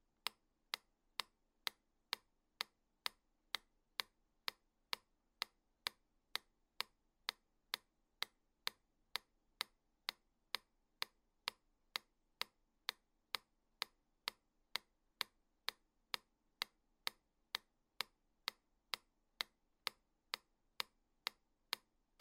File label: metronome